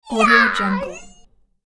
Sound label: kid ninja